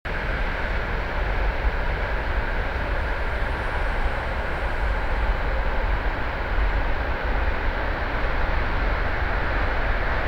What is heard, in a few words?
Train Platform Cars Passing, Stockholm; lectroteque